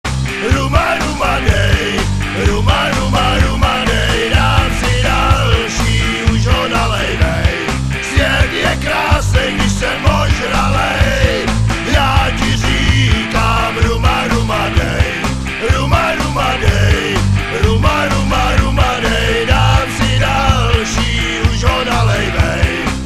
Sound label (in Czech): hymna